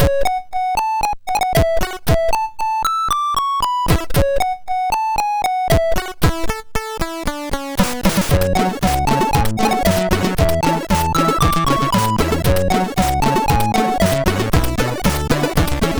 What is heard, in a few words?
Medieval Party sid MOS6581R4